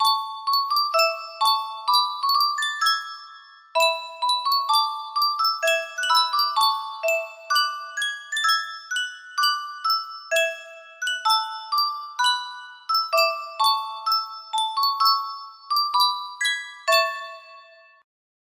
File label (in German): yunsheng spieluhr